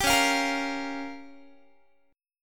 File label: Db 6th Flat 5th Db down Guitar; Db6b5; strummed